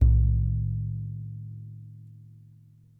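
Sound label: Large Frame Drum, World Percussion One Shots; Sony CS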